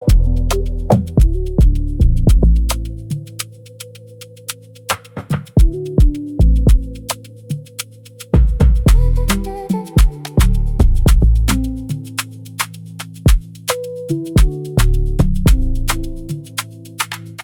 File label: instrumental 8 bar 110bpm